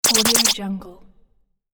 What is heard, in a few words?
android program blips; sonic-boom